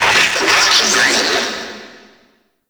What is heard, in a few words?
Necramech Friendly Hack Vocal; Warframe; Entrati; Nechramech Hack